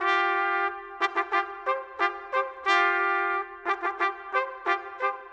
Trumpets, Orchestral; Sonic Foundry (Sony Creative Software); Orchestral VOL-2; Modern 25

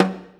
DANCE SET, DRUMS

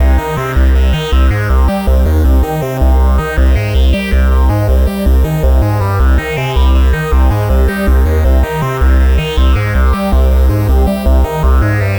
VA Synthesis, Keyboards; Sonic Foundry (Sony Creative Software); Leo Cavallo Bass Taster

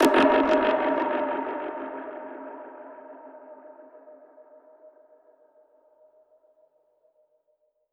Perc Hit, dub percussion; musicradar

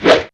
SCRATCH UP, VINYL; Back In Time Records; Big Bang

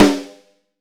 SN, LUDWIG SNARE

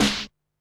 snare, techno 138 BPM

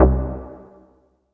C2, synth line

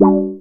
PERC FX; SFX

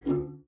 PLOK C2, SPINET; Xsample VOL-7

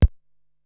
Synth Thump, Ensoniq SQ-R Plus

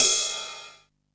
Ride, Drum & Bass, Hats & Rides; AMG - Prototype Drum & Bass

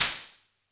closed Hat, Yamaha FS1R

